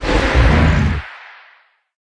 troll stun

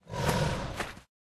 Tiger Dodge

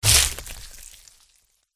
scorpion attack contact up

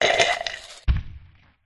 riding bug death

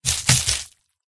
cat attack contact

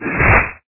rock golem attack

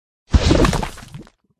turtle attack bottom

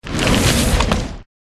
Bike

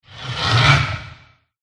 dragon boss attack voice bottom

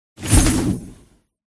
ent

